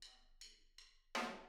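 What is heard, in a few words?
Intro Room stereo, drums, 162 BPM; Drums from the Big Room The Mixes; Take Your Toms Off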